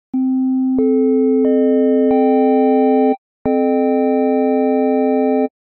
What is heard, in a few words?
3579 Harmonic Chord